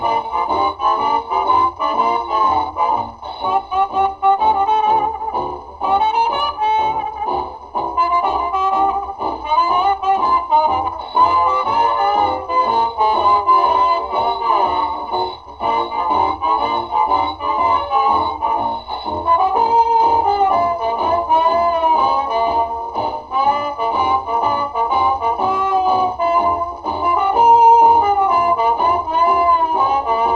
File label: music; Mafie 1